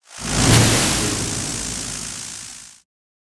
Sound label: Electro Hit